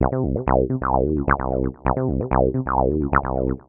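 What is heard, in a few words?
tb303 acidline, 130 bpm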